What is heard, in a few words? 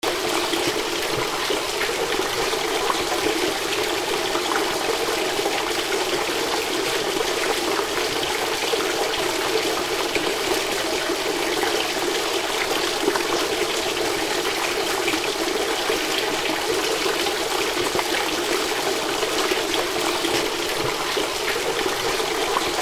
fountain; germany potsdam friedenskirche